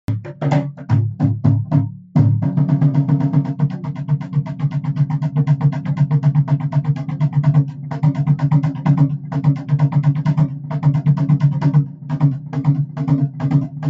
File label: Nagara, ساز ناقارا